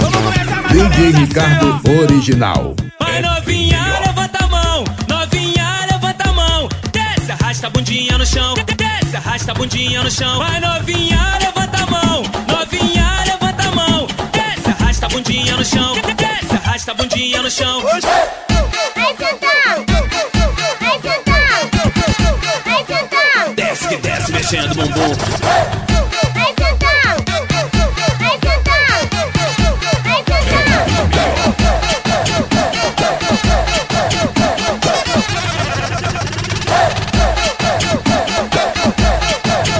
pancada mix

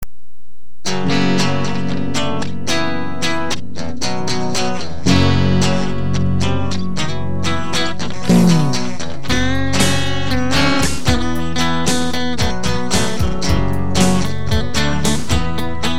instrumental

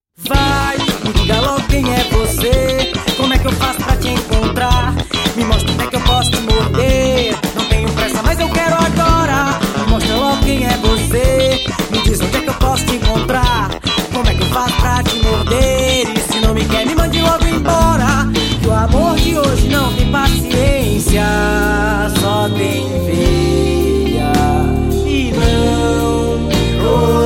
keyboards

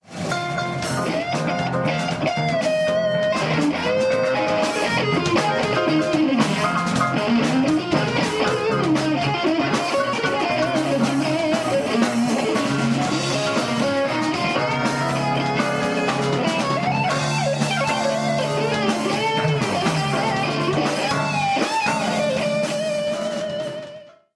banda instrumental

